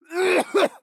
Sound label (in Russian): Кашель, фраза; Лусио